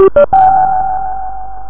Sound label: gong2; S Clock